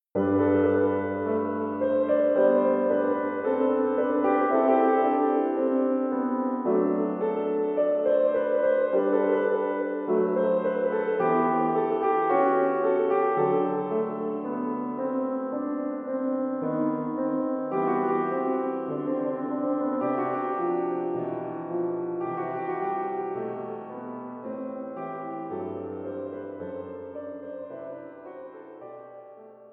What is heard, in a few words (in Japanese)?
ピアノ版試聴; ウ゛ォカリース